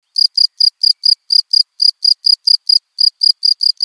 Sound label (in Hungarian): tucsok